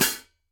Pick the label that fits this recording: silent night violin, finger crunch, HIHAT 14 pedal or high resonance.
HIHAT 14 pedal